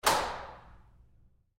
Door Bathroom Stall Lock Roomy Turn Fast

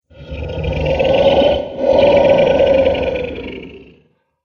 Dragon Getting Tired, monster; horror

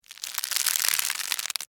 Wood Twist Handle, Stress; Foley; Wood (Sound Effects:Materials); BlastWave

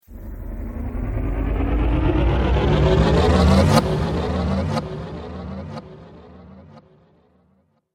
Dance Riser; Rise And Dive Sound Effects; sound design

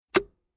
Plastic Container Lid Popping Sound Hollow Single

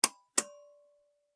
Light Switch (Pull Cord); domestic; Household; McKinney Sound

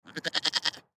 Goat Bah; Farms; Backgrounds; Animal; Blastwave

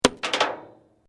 Glass movement minor

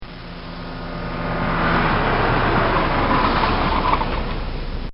Fiat Hard Brake; Cars; Vehicles

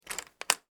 Foley Deadbolt Lock Door; Locks (Sound Effects:Objects)

